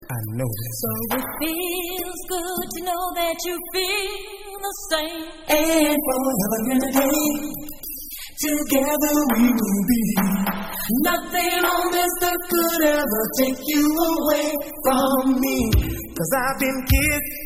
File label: Acappella